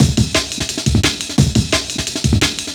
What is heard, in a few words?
DNB BREAK; m8 breaks